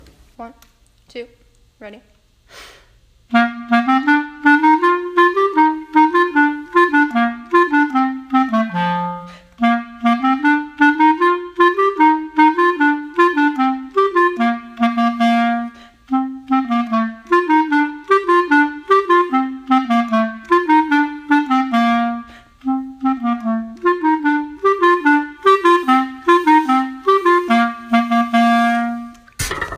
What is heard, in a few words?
bass clar